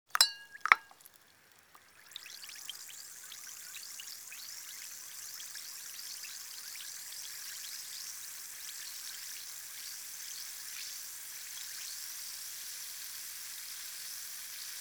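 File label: Tablet dissolves, aspirin fizzes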